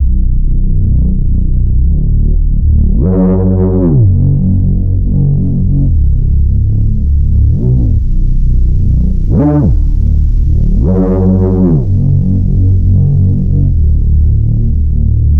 Burial Growl

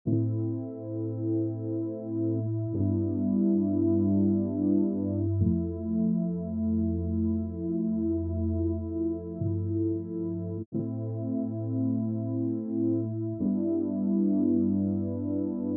prophet v; arturia

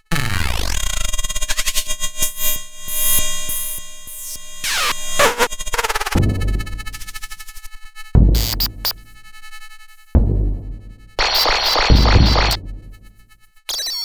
Modular V; arturia

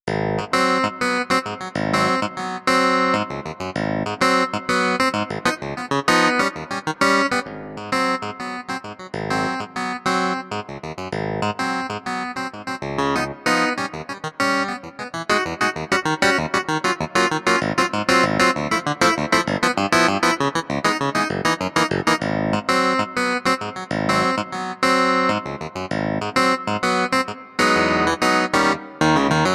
elektrik Swingy, elektrikpiano

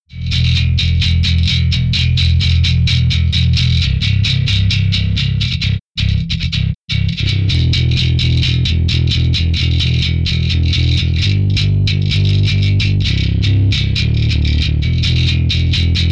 Electric Bass 2 V76 CRUNCH; arturia

